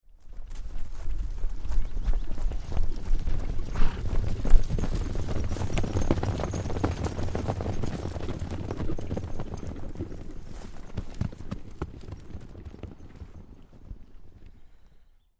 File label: Flock Of Horses Run By On Grass; Approx 8-10 horses trot past on grassy dirt ground; Horse Sound Effects